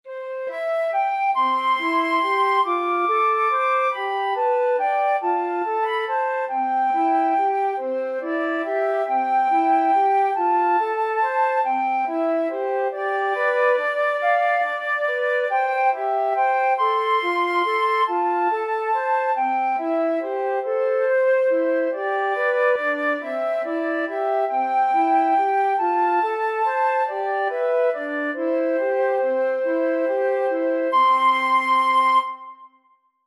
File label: morning has broken flute duet